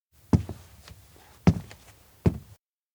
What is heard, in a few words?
steps indoor school to class; anemoi パッケージ版 1.1